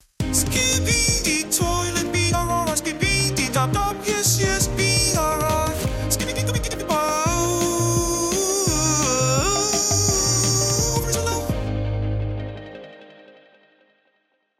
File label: Text to Song